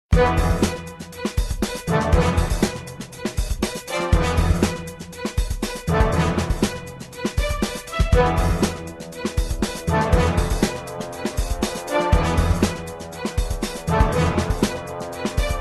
hungry shark background music

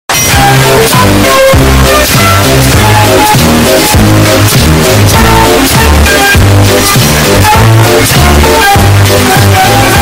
Bass Boosted

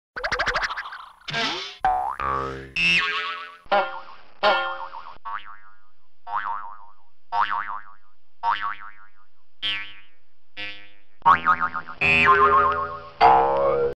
Cartoon Spring Boing Jump Sound Effect

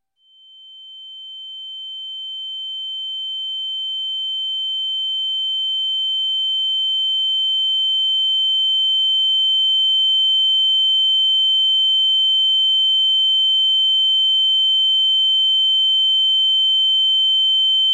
Ear Ringing

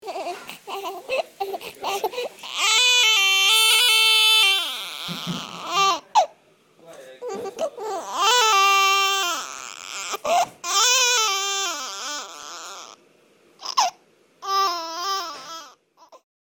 Baby Crying with auto tune

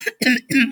goofy ahh cough

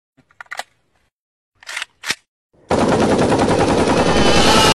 Cat load ak47; meme

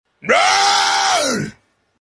goofy ahh scream; made with Voicemod

